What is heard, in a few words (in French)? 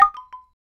bambou mel